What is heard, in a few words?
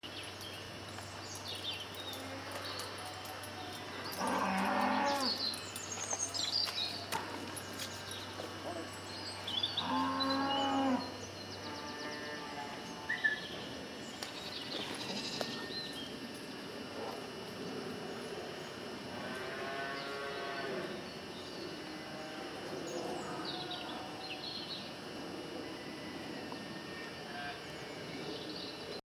Cows Birds Sheep; Aylesbury trip; Some quick sound bits from the Aylesbury trip.